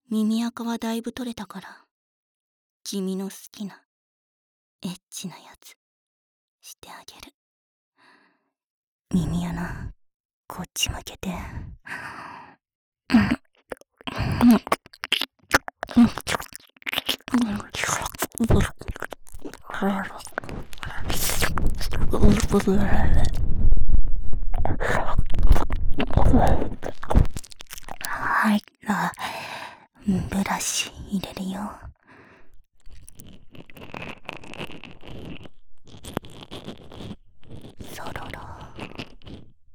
【右】唾液ブラシ, ASMR; 耳かき

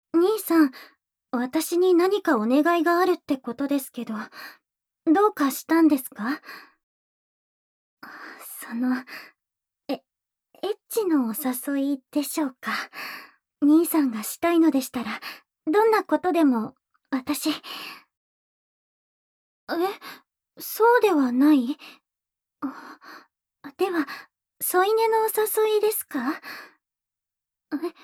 おまけトラック・耳舐め右耳

